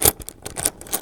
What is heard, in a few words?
coin